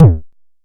analog quick, Kickbank

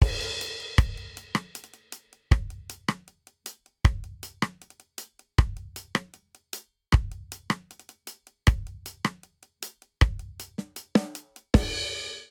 Straight Reggae Intro 78 bpm; 4 4; MIDI Clips; Ableton; Factory Packs; Drum Booth

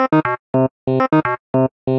Tone Seq, Vector Wave Sequences; Tone Seq 2; Ableton; Retro Synths; Components

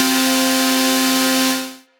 FM Sequential, Synth Keys; Ableton; Glitch and Wash

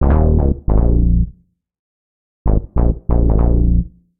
Bb Minor 87bpm, Bassline; Freak-o-saur

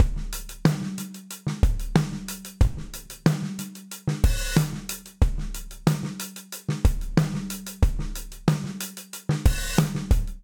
Fatback Warm And Low 92bpm, Session Drums; Fatback1; Session Drums Club; Ableton; Factory Packs

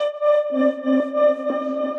Sensor Lights, Synth Pad; Ableton; Glitch and Wash

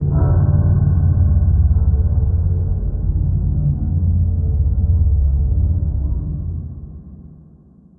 Spaghetti Texture; Laboratory; Drone

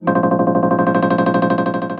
Piano & Keys; Plateaux

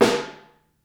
SN3 Room, Snare; Ableton Foundation; Snare Designer

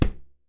Book; NI Kontakt 5

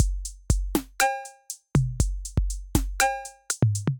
808 Kit, Drums Synthesized; OP 808 Kit; Ableton; Factory Packs; Drum Essentials

